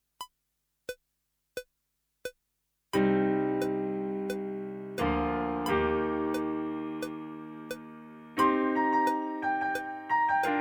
PIANO CLICK

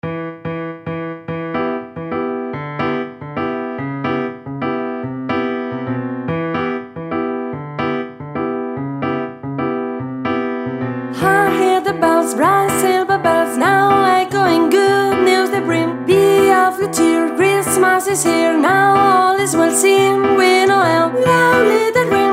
CAROL OF THE BELLS SOPRANO; ADIOSES SHOW CHOIR; Navidad 24

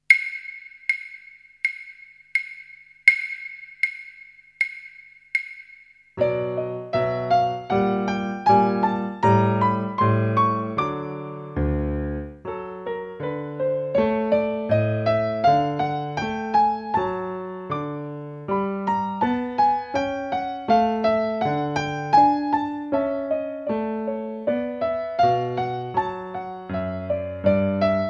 Backing, Violín; Caterpillars

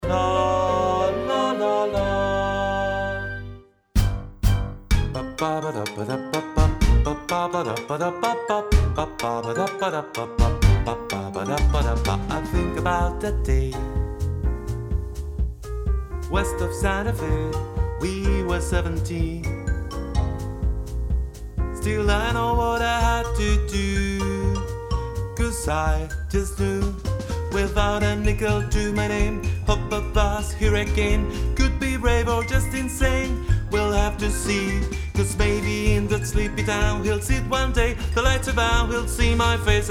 BAJO; ADIOSES SHOW CHOIR; THE CHRISTMAS SHOW; LA LA LAND

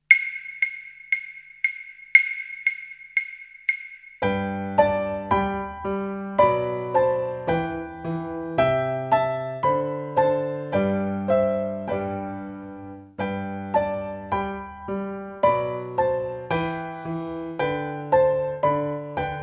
Backing, Violín

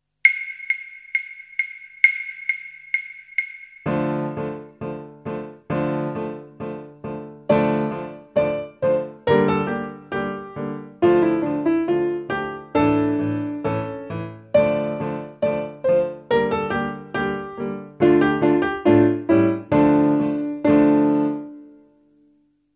Backing; Violín

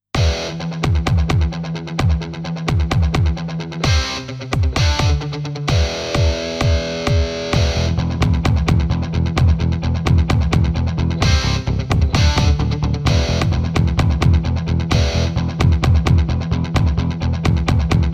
Indian Song, Música; Solfeo Adultos; Ritmomanía

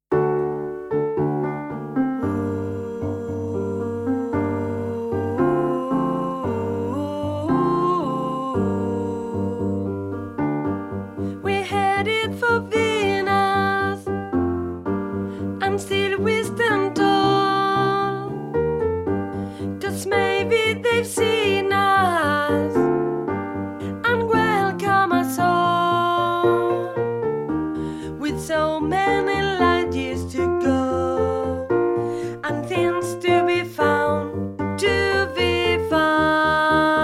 ALTO, SHOW CHOIR; ADIOSES SHOW CHOIR; THE CASSETTE EXPERIENCE

